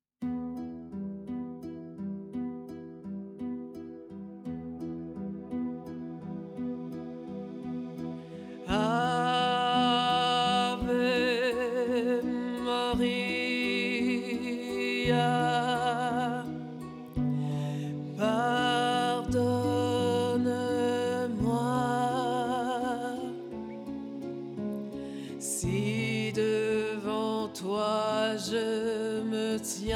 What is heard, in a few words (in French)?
Chant, Sensible - Français soutenu